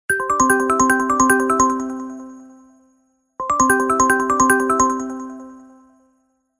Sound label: Oscillator; Tecno Spark 40C; alarms